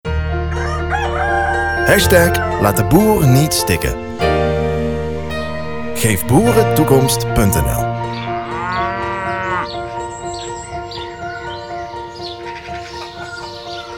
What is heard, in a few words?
ringtone android; geef boeren toekomst